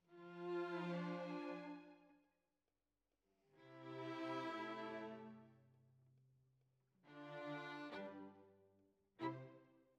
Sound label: Violas, jazz for piano